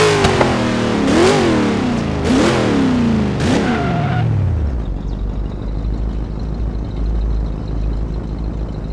throttle off, vehicles

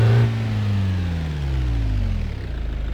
throttle off, vehicles

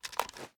reload empty xmag rotate; gmod

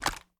raise first end hit; gmod

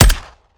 sup plr; gmod; viper; weapons; scharlie

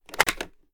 reload empty fast mag out; weapons; gmod; viper

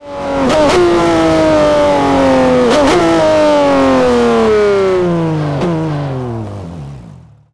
throttle off, vehicles; gmod; tdmcars; por rsr

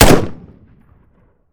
weap fire; weapons; gmod; viper; scharlie

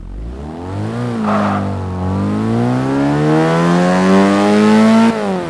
first, vehicles; cooper65

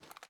kilo98 inspect; viper

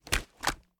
reload xmag end; gmod

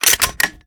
raise first bolt close; reloads; gmod

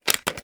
plr sm beta reload empty mag in; gmod

